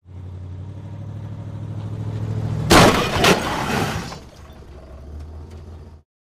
Drop Impact Moving Vehicle Collides With Stationary Vehicle In Reverse Gear